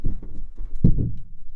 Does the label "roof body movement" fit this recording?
Yes